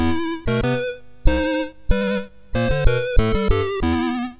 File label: MOS6581R3, sid; Night Knight